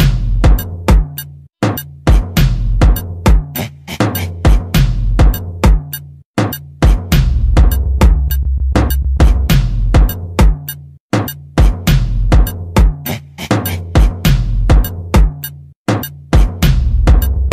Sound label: background